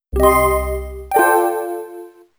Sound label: Jingle; astrofra